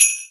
xmas sleigh bells

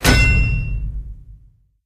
knight axe miss; tf; halloween boss

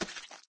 flesh, footsteps, run

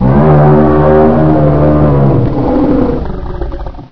die; source engine; bshift; garg

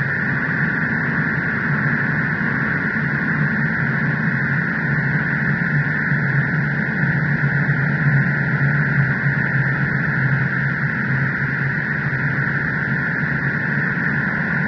cave scree loop; video games; ambient; source engine; portal2

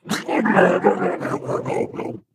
ballbot smash into wall

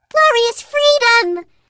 turret launched

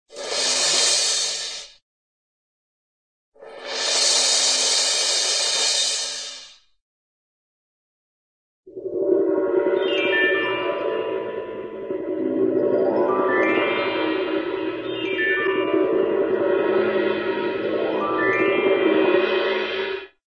magic light cymbal roll; cartoon

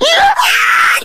rage at victim, infected, male

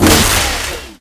chamber open; Source Engine; tf

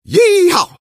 taunt exert; engy; tf; taunts

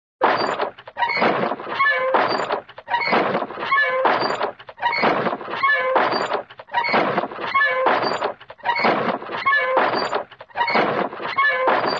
machine, cartoon; smurf

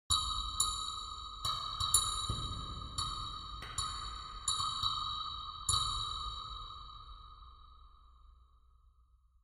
ambient synth grain click

